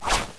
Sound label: knife swing; cstrike; codlife